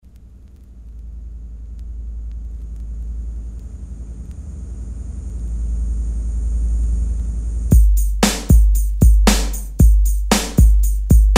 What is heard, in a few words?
Dub